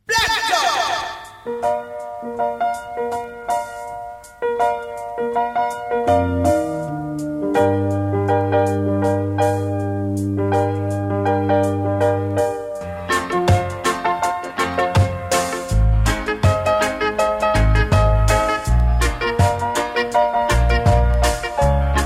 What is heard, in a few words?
Black Organ; Pressure Sounds